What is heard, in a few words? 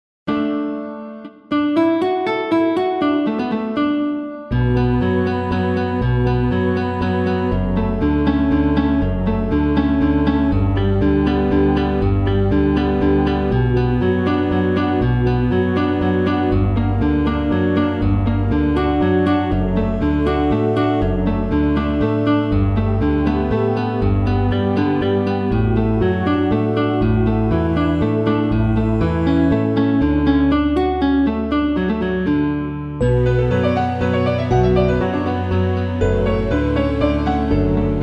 Guitar